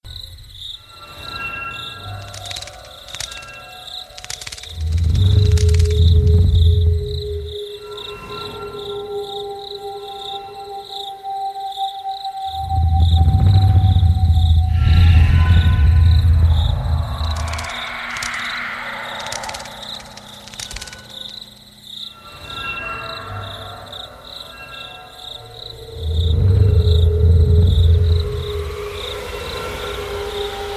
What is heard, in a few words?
Spooky Ambience; Deadtime Stories